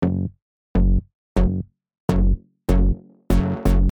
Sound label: Sub lower midrange and processed chord